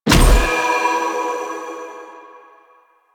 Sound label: Choir Bow Arrow Explosion; Warframe; Weapons